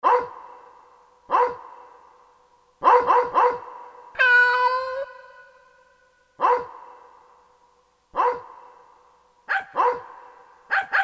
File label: VOICES, Korg DSS-1; Sound Tapestry 1